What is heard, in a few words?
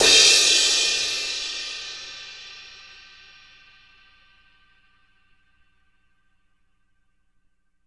CRASH